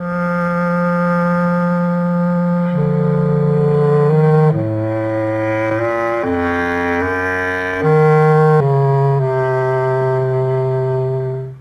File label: flute, Loops